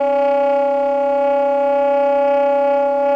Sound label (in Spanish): Tremolos